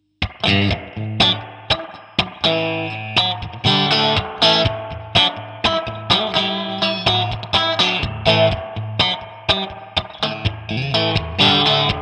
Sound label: Channel 1 Strat Bridge PU Reverb